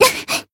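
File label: Voice damaged, Japanese; hoozuki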